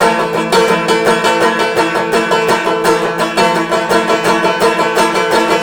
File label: banjo; zombat; danger